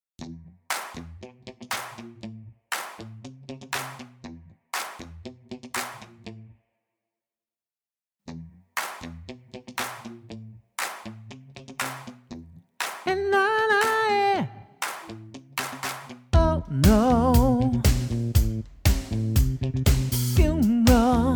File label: high